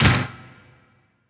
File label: door shut; RPG Maker; The Huntress of the Hollow v1.2